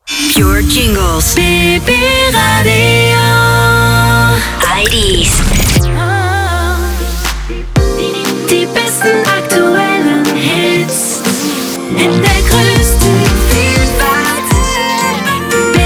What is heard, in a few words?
jingles radio jingles; pure; bb radio